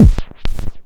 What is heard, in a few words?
Kick Record Runoff Groove, Cwejman S1